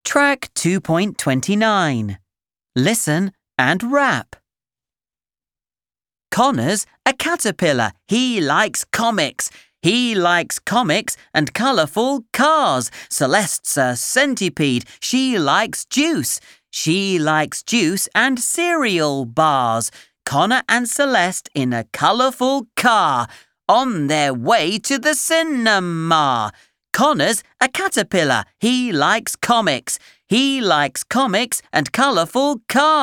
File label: RAP